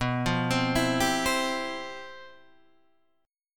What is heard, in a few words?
G 13th B, chord